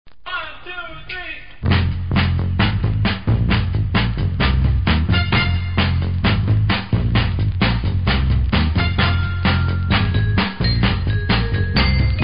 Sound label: Instrumental